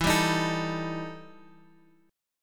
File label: E Minor Major 11th E